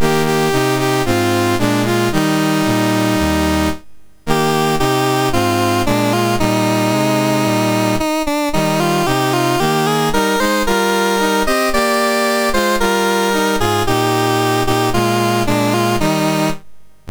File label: MOS6581R3, National Anthems; Sri Lanka; Dave Marquis